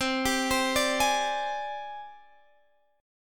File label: G sharp Major 7th Flat 5th, chord